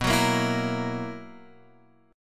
B Major 7th Suspended 4th B down Guitar Open F; BM7sus4; strummed

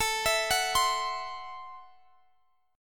C 6th Flat 5th A

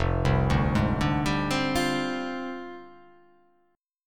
F Minor 6th Add 9th, Fm6add9 Chord; JGuitar

